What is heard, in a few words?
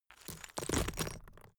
stone clatter